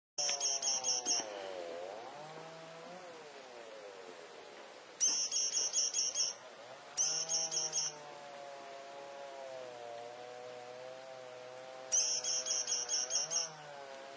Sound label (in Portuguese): Canto do beija flor de papo branco